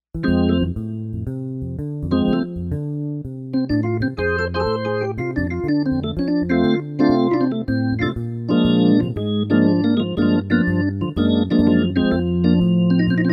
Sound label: Walkin On